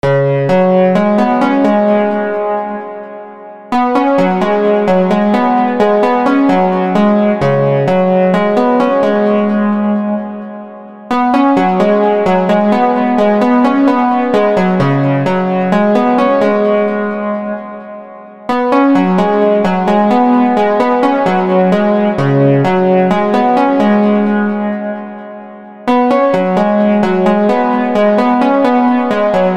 150 F min Main Lead

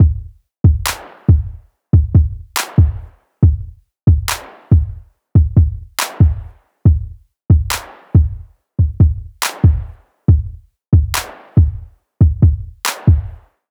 140 Tribe Kick Snare

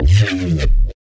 Mid Range

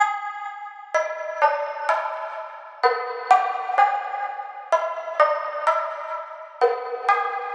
MELODY LOOP PLUCK G 127 BPM AMBIENT RNB

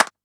CLAP & SNARE

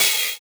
Open Hat

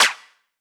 Snare Shot

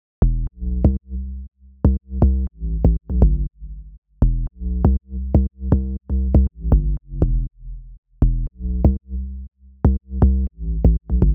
Sub, 120, E, Wet

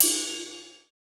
Rises BPM125 Cymbal

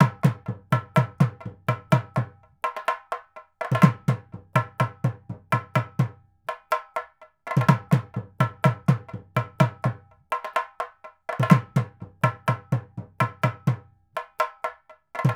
125 tavil; genesha

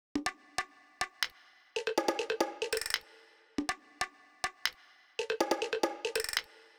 Bongo

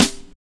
Snare

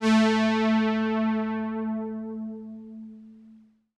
SYNTH A

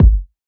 kick one shot; TROPKILLAZ

